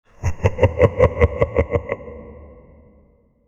evil villainous laugh

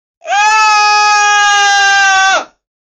man scream; anguished

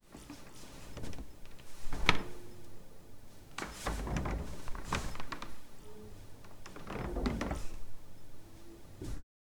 soft wooden creaks, library

